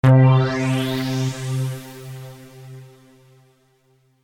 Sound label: Power Up